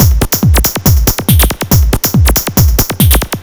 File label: loop C, Techno Drums; TechnoMusicMaker